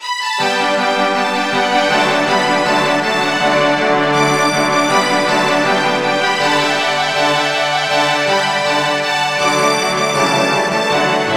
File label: DIM FLUTE